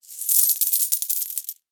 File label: jackpot winner